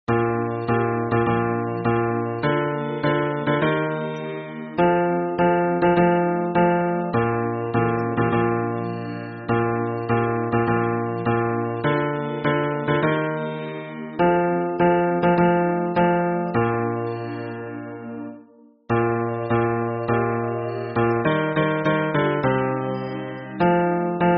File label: hymn bass